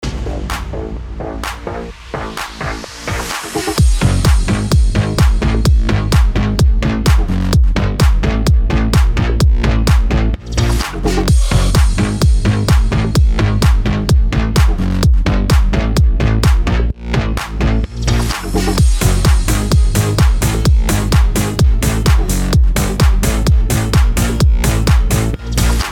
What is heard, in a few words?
BED BASS HOUSE FMIN 128BPM